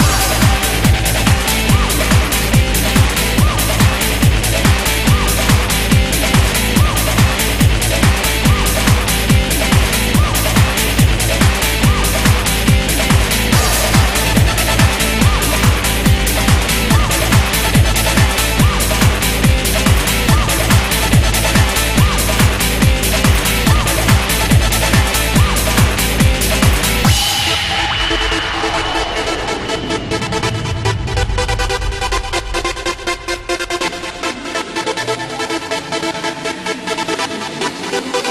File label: Fire Instrumental; Mortal Kombat Annihilation